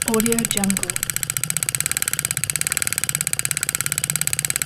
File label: synchronizer spin 35mm film rotating with break; 35 میلی متری; interface sounds